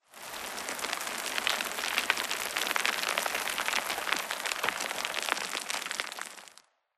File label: Rock Debris Small Long; Boomtown; Titanfall 2